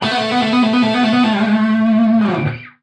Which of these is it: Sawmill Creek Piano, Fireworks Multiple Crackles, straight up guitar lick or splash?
straight up guitar lick